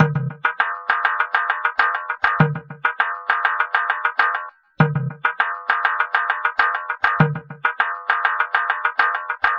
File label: Tin Drum; Electronica; Solo Beats